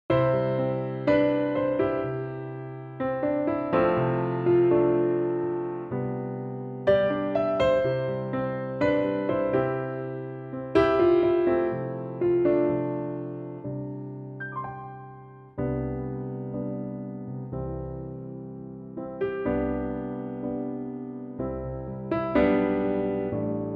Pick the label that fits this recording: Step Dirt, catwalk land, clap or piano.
piano